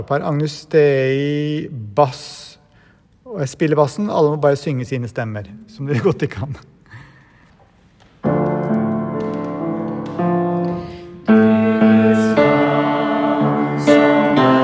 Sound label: agnus dei bass; nedre eiker; kirkekoret